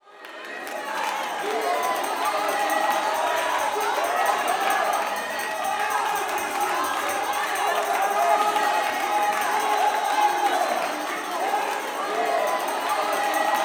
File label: WORSHIP, Gospel; Spectrasonics; Vocal Planet; WORSHIP AMB